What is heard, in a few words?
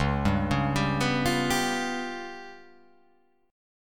C sharp 7th Flat 5th, chord